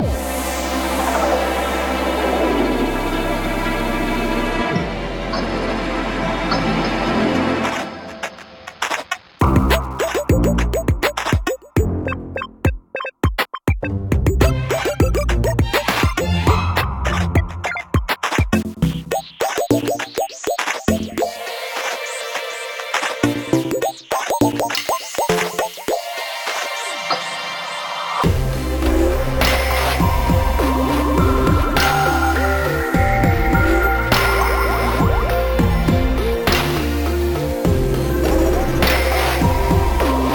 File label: Underwater Music